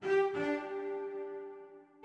music orbit cello; sky children of the light

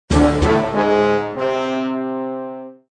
Viking Horns

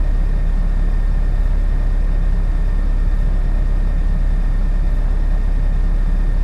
Ambient O2 Room

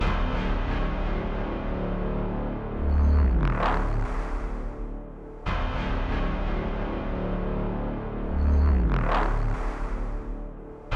Dramatic Background Theme; Astro Flame: Starfighter; PS4, PS5, Switch, Xbox One, Xbox Series X|S

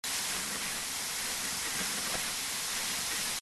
Ink Board Sliding

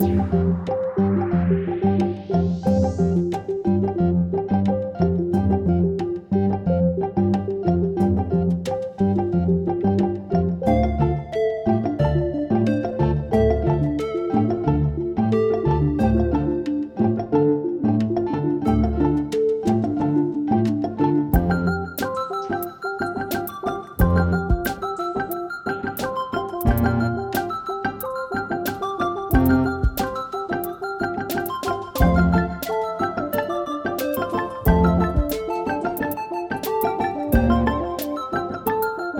Speedy Loop 180bpm; Opseck; jenny leclue detectiv

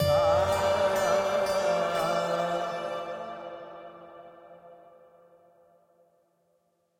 Amb Stinger Rifle Found; assassin s creed chronicles india; Katasraj

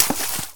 Footstep water light; 1916 Der Unbekannte Krieg

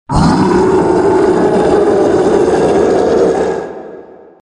Sfx Roar; Sfx Baphomet Roar

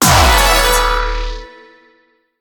win tourney match

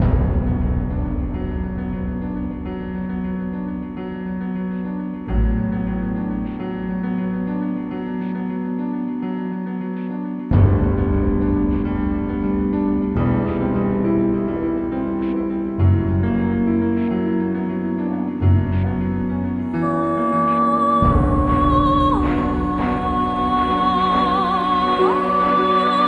BGM; Adventure Player; psp

